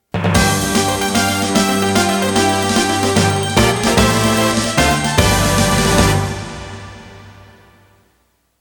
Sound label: Fanfare; TwinBee RPG